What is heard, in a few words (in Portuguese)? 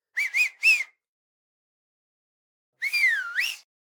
assobio